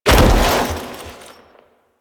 Foley Roll; Warframe; Kahl

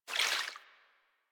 Vial Foley; Warframe; Warframes; Alchemist

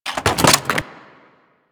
Weapon Drop B; Weapon Drops; Grineer

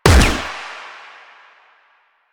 Fire, Weapons; Latron Prime

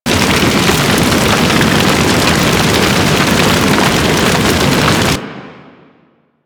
Fire Vent Loop; Warframe

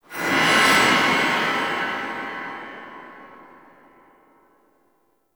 Boss Fight Window Stinger; Warframe; Captain Vor; Captain Vor Redux

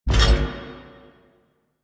Bash Cast; Warframe; Hoplite

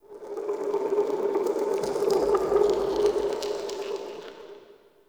Magic Cave Texture; Midrath Island; Fey Hub

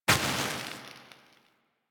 Enemy Burn Death; Warframe; Caliber Chicks minigame; weapons; hit effects

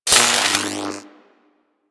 Lightning Rifle Spark F; Crp Chain Lightning Rifle

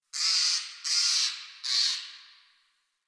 Tree Critters Happy Calm, Ambience; Props; Warframe; Duviri

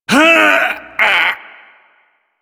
Ability Lift Laugh; Kuva Lich

